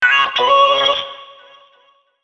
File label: Vocal one shot C#m; Slate Digital Omnivox Vocal Sample Pack